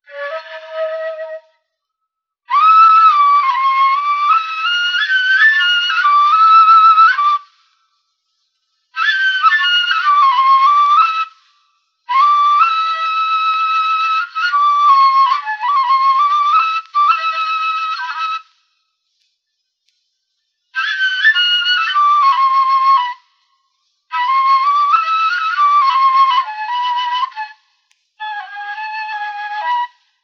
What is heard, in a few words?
flute taqsim nayati